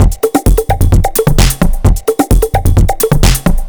Distorted Drum Beat 130, distorted drum loops 130bpm; DD Beat DOA